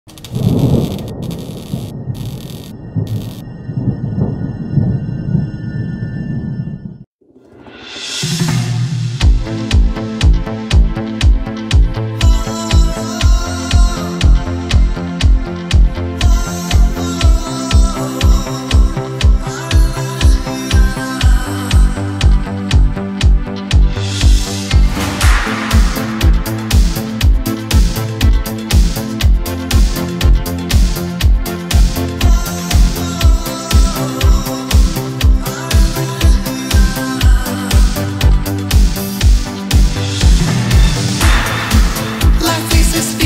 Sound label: euro dance italo disco